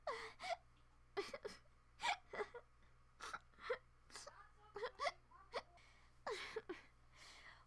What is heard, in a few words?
sobbing; Alice